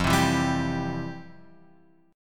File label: F Major 7th Suspended 2nd, F, guitar; FM7sus2 Chord {1 3 2 0 X 3} strummed